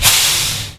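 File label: Emergency Brake, Train; R110A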